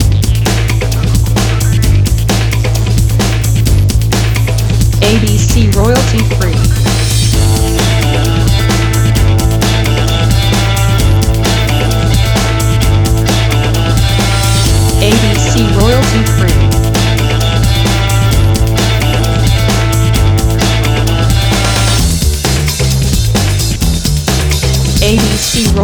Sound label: Guitar Breakbeat